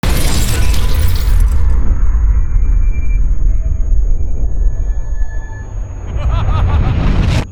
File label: All Clear SFX; Bloodstained: Ritual of the Night